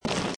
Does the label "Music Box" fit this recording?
No